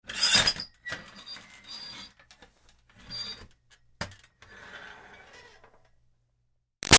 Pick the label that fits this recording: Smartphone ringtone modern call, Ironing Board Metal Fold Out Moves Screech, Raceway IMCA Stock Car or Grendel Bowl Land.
Ironing Board Metal Fold Out Moves Screech